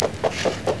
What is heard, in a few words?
horse restore down; 250 Desktop Themes